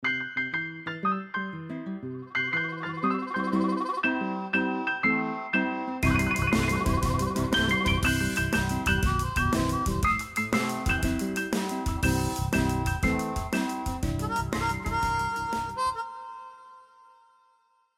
Blues; Coolpad Dazen X7

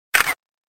camera click; Oppo; T703; ui

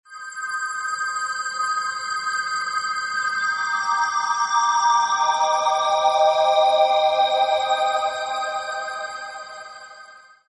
Ambience, ringtones; HTC; Magic

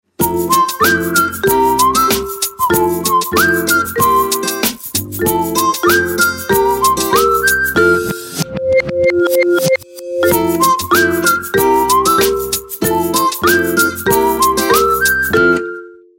Whistle; Qiku Luna Youth

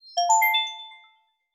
Melody, notifications; Rollable LM R910N; LG

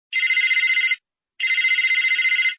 MIDI; SkyBee

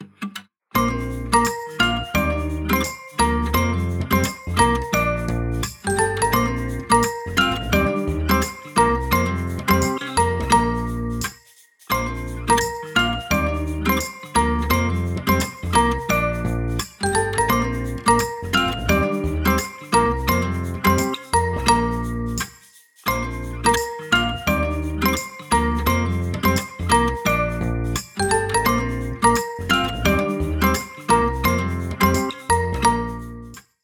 Guitar Dance, alarms; Honor; X7C

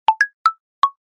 Seventh Chords, notifications; Infinix; XOS 10

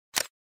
camera click; L18VC Classic US Celular; LG

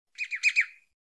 Clear Chirps, notifications; Tecno HiOS 16

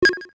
Videogame MMC insert; Nokia; audio themes

